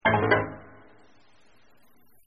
customer walks out angry; Delicious Emilys True Love

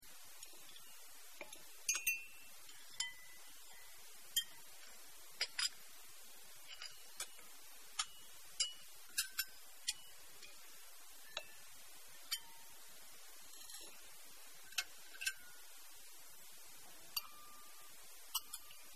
customer eating loop; Delicious Emilys Wonder Wedding

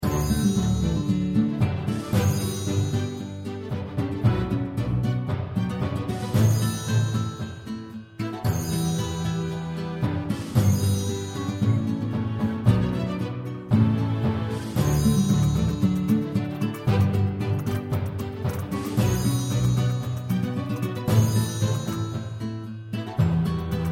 music; New Yankee in King Arthur's Court

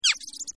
mouse; Delicious Emilys Childhood Memories

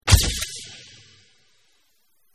photoflash; Delicious Emilys Childhood Memories

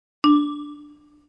for sale appear; Buildalot

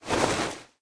dress put on off; Delicious Emilys Wonder Wedding

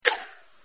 checkbox off; Delicious Emilys True Love

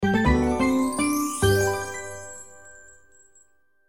task done; New Yankee in King Arthurs Court

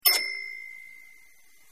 end level money totally counted; Delicious Emilys Wonder Wedding

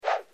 food thrown into the air (towards tray); Delicious Emilys Childhood Memories

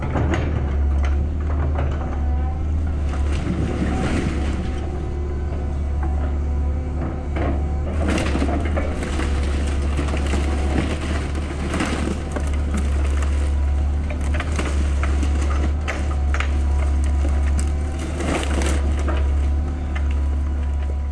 Demolish; Buildalot

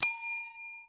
for sale; Buildalot2

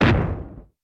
explosion; Rescue Team3

